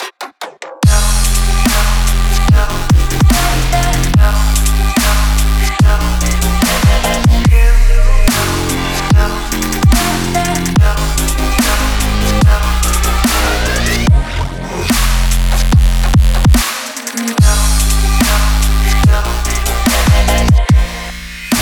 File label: extreme sports